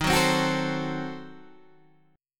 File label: D sharp Diminished 7th D sharp, Guitar, Open G; 13,11,10,11,10,10